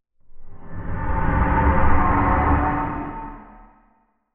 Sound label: Fast Moving Horror Transition; Scary Soundscapes (Sound Effects:Horror)